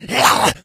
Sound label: shoved, infected, rage; npc; Left 4 Dead 2; Source Engine